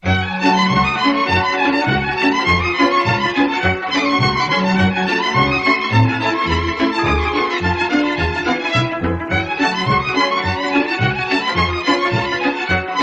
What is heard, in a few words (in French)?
Hora lautareasca, Roumanie